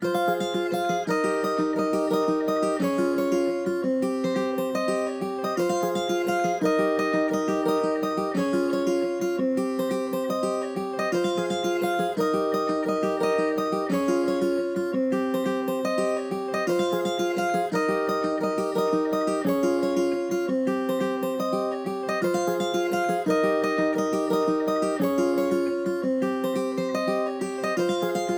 173 BPM F Min Guitar; Trap; Loop Stems; Empire

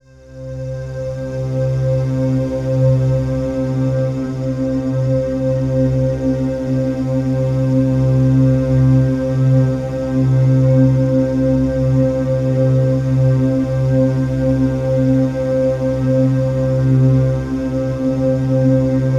Atmosphere

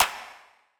Clap; Cymatics; Light Free Sample Pack; Light Samples

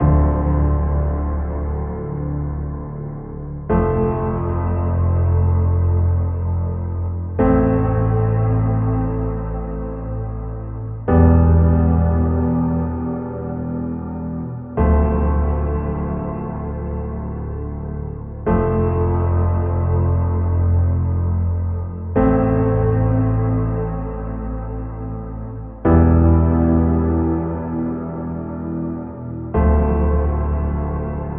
Mood Piano - Heavy Tone - Dm 65Bpm; Ghosthack; SkyRealm

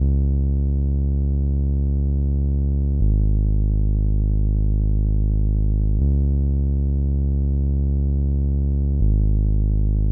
80 BPM F Min Bass; Melody Loops; If You're Not Real; Cymatics; ANALOG EVOLUTION Beta; Lofi Collection

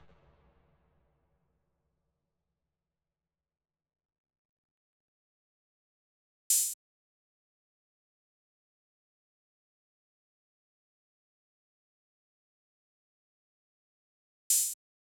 Drum Loop, 120 BPM Open Hat; Loop Stems; Cymatics; Vibes II Drum Loop Collection; Operation

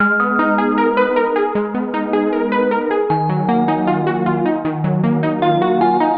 Melody Loop - 155 BPM D# Min; Cymatics; Oracle Sample Pack

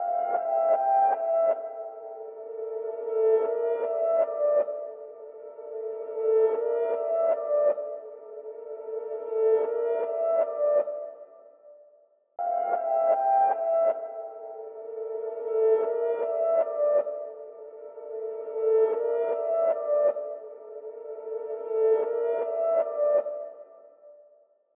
155 BPM D Min Piano; Reverse; Loop Stems; Cymatics; Throttle; 2022 Melody Collection

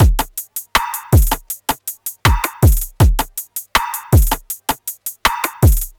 Full Drum Loop 160 BPM; Drums; Humble Hip Hop Sample Pack

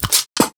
smack, Cartoon impacts and smacks

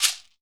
Shekere; percussion; Cymatics - Infinity Beta Pack 2.0; Zeus Percussion Collection

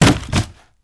On Chair; Fairlight Sound Lib

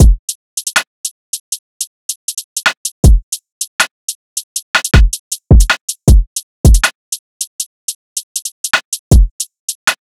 Drum Loop 158 BPM, Hip Hop; Drum Loops; Lightning; Cymatics

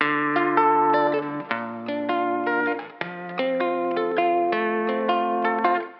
Guitar Loop Wet - 160 BPM D Min; Melodics; Instrument Loops; Guitars; Processed; Cymatics; Cobra Hip Hop Sample Pack

